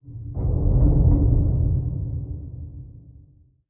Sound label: submarines engines; World Of Warships